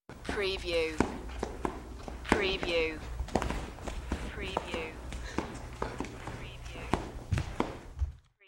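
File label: Flip Flops Carpet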